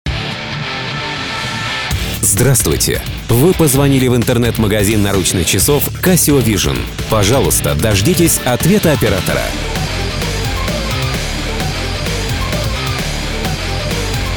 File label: casio